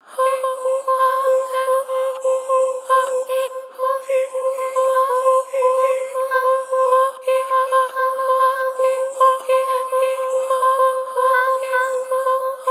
Effects Scattered, more processed sounds, although still rooted in the underlying vocal sources; vocal samples; Void & Vista; Kontakt